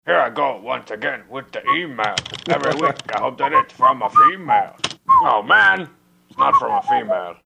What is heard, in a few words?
whistling strong bad email segment intro